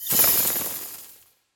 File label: bats; smite; scylla